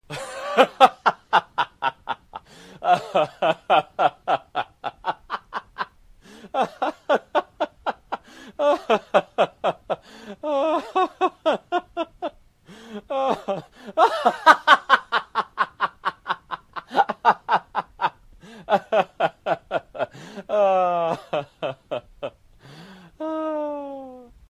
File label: man laughing